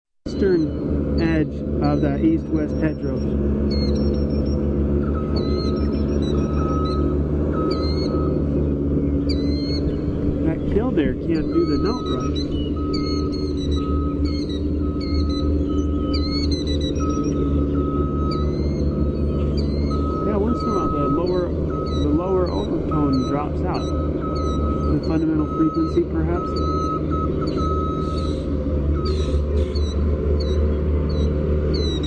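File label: Killdeer dee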